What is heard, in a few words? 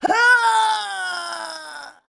male scream